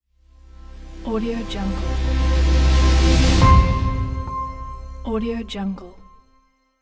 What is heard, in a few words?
logo reveal; fox-production